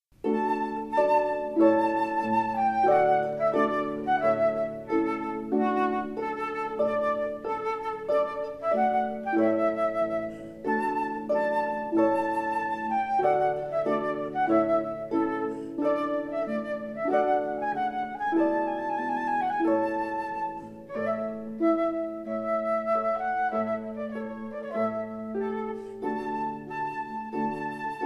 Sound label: with harp, Flute; Trumpet Tune